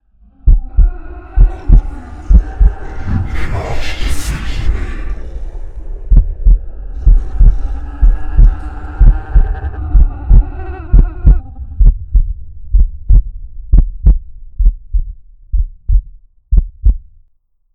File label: heal; Necromancy